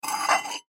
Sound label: Tea Cup Lift, Tea Cup Lift Scrape Saucer Antique; Dishware; Household; Blastwave